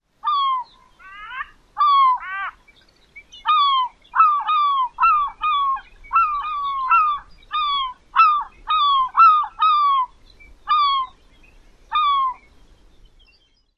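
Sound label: Glaucous Gull more calls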